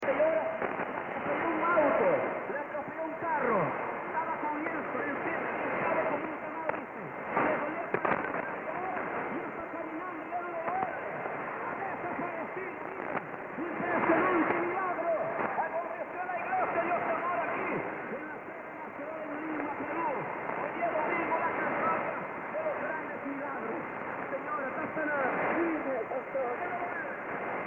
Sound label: Radio 6.0120MHz, outdoor reception; Radio Victoria